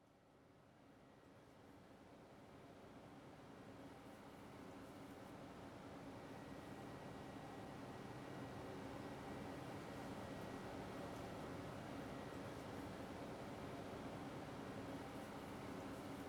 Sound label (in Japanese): 好き好きお耳マッサージ ASMR